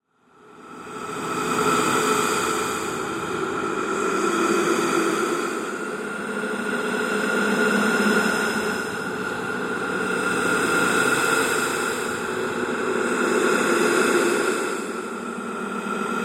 Bed Ghostly Swell, Production, Element, Bed, Ghostly, Swells, Crescendos, Eerie; BlastWave